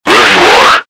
attack; slender; gunner

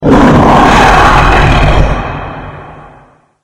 hk chatter; slender; hellknight

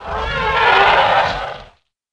garg pain; slender

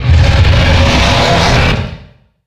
garg roar short; slender

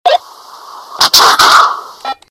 alert; slender; gunner

